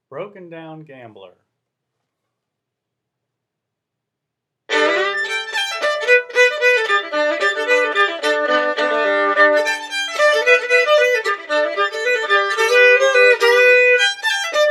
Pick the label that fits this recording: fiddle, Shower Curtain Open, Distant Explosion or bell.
fiddle